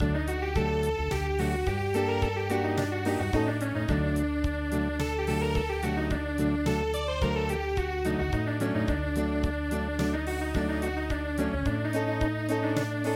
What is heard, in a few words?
Acoustic, traditional; midi